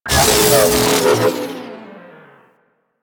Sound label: Mutalist Death, Vocals; Warframe; Proto Infested